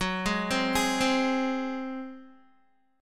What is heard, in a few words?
Gb Suspended 2nd Flat 5th Guitar 4F,4A,5C,5G,5C,6E; arpeggiated; JGuitar